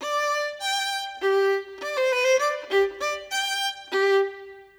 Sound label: Violin